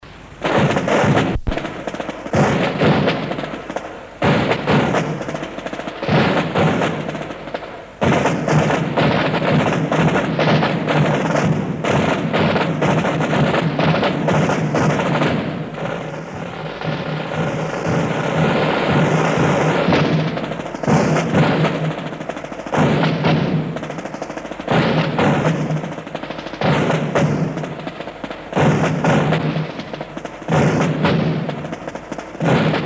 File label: QuickStep